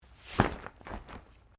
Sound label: Umbrella Shake; Umbrellas (Sound Effects:Objects)